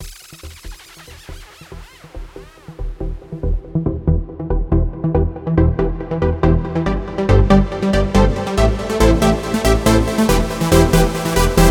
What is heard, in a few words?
dub jingle